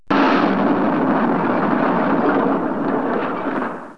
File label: THUNDER